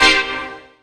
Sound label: STAB